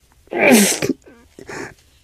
launch pain; elivance

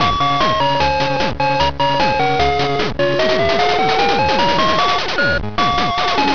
victory

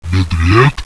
zombie alert; boomario

